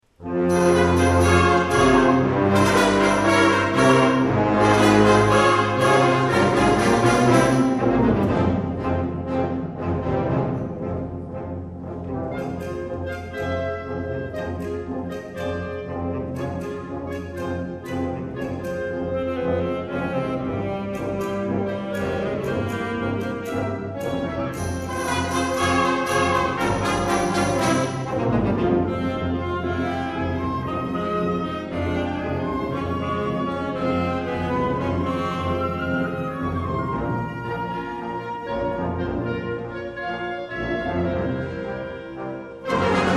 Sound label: a little tango music